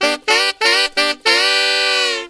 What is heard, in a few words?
SAX, W. AFR.; Spectrasonics; Heart of Africa